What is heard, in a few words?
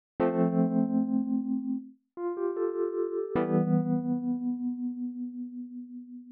el piano; bombogroove